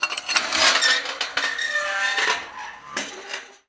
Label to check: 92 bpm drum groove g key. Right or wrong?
Wrong